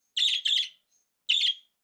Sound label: budgie sound